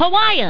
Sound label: hawaiian